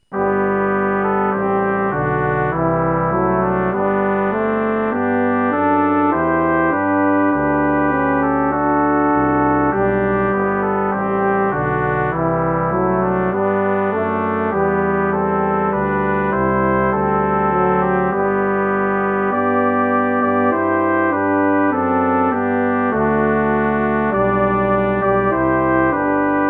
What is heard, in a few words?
Brass Quartet